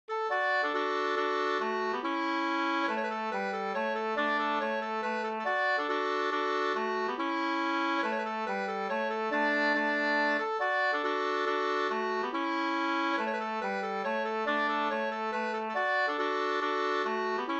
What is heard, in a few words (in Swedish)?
mazurka; Drucken karl-leken 2st